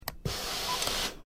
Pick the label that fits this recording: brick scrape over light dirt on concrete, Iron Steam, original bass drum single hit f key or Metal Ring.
Iron Steam